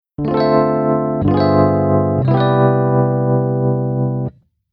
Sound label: Keyboard EP Mod